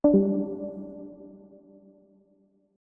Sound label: discord leave sound